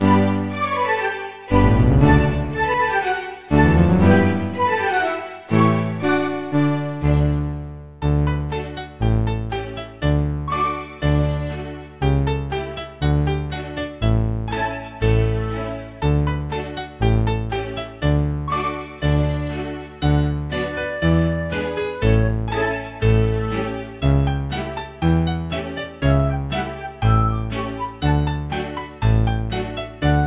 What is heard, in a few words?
Gavotte